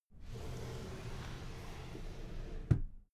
drawer cabinet paper close soft

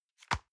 envelope drop medium slide

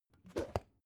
plastic bowl open

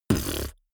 beatbox bass drum wobble

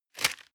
plastic bag small grab hard

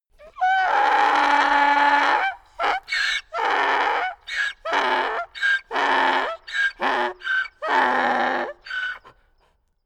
donkey scream

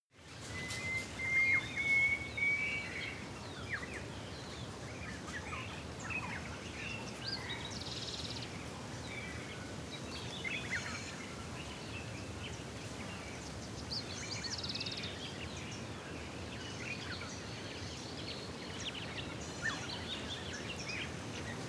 african bush birds windy

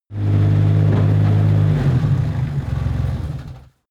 mini tractor stop

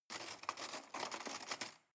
cutlery cake fork drawer handle

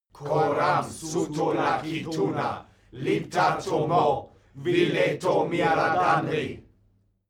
male crowd evoke

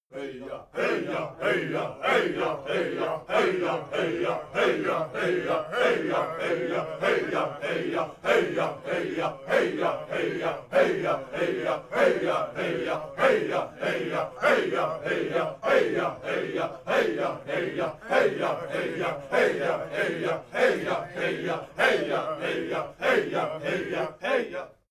chants simple

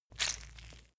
leather impact mud soft squeeze

